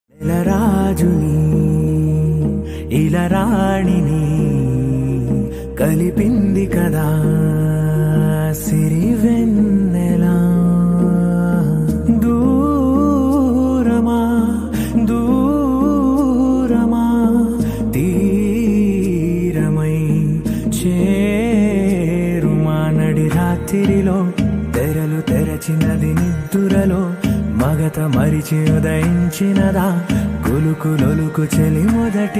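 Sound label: song background music ringtone; shyam singha roy; nani; sai pallavi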